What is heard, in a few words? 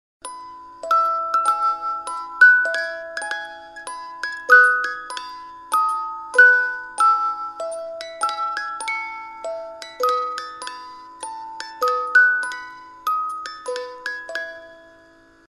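New Year music box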